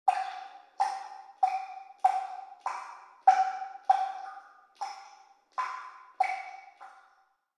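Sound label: Room Shower Faucet Drip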